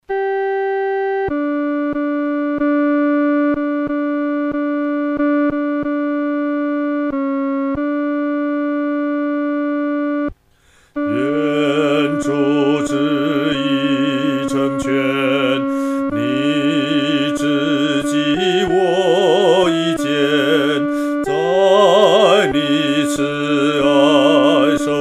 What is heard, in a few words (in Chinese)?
独唱 第二声